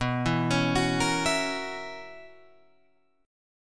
B Major 7th Suspended 2nd Suspended 4th B down Guitar Open F; arpeggiated; JGuitar